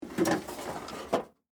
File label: Tool Box Lid Open, Industry, Tool, Box, Lid, Open, Metal, Case, Antique; Hand Tools; BlastWave